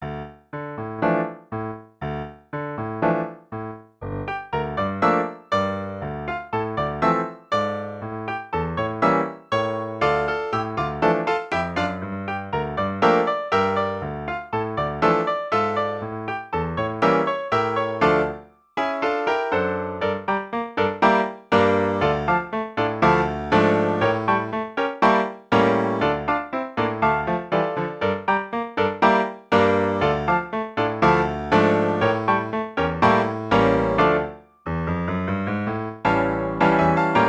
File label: Traditional 6 Hands 1 Piano